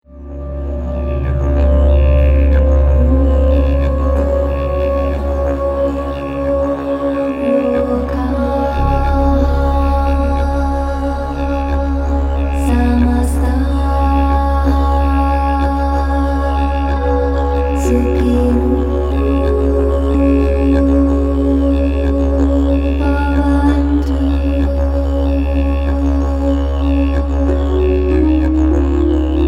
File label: Didgeridoo Peace Prayers